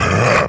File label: pain; css; kfsounds